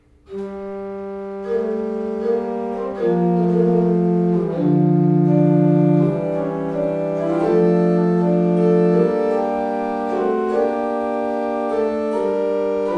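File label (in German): Orgel, pfeifenorgeln; Kreienbrink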